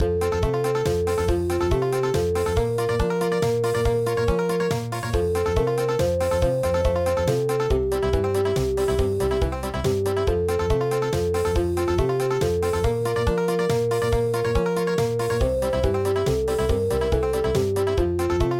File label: Beautiful Brown Eyes country